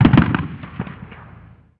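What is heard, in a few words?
gun assault medium; Call of Duty Modern Warfare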